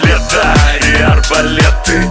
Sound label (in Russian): arbalet; tf2; leto